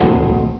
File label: 2 BELL; Odyssey